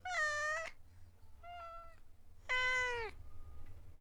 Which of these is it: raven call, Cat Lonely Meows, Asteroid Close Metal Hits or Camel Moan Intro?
Cat Lonely Meows